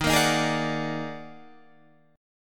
Eb Minor Major 11th, Guitar, Drop D

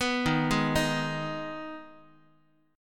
D sharp Suspended 4th Sharp 5th, Guitar; arpeggiated; JGuitar

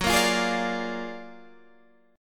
Gb Augmented Major 9th Guitar Standard; Gb+M9 strummed; JGuitar

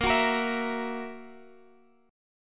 F sharp 7th, guitar

